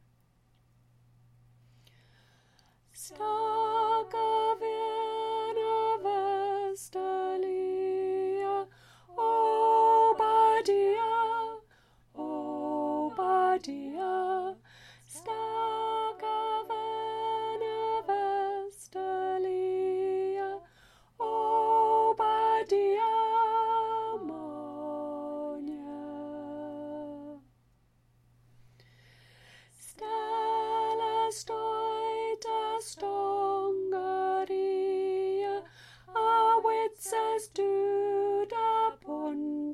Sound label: unst boat song high, community choir